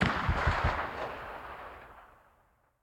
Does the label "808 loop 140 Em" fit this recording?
No